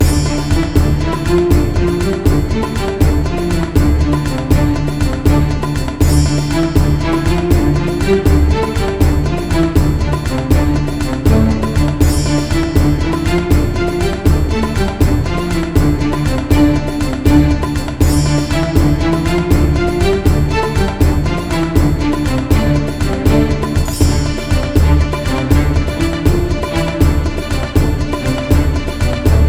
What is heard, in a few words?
Medieval Action - Medium Loop; Midas Gold Plus